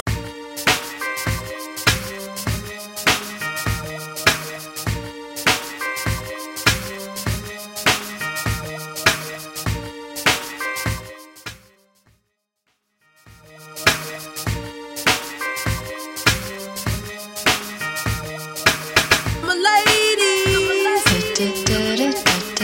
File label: 90s Pop Hype Into Extended 100 Bpm; Mainstream VIP; Vegas Edit